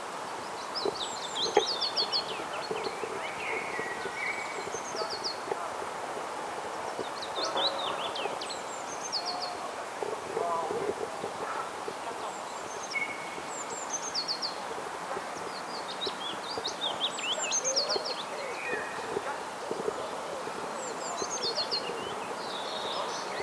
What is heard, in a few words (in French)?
pouillot fitis; livry garga